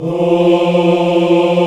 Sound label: VOX African Ahs; Roland LCDP11 Africa VOL-1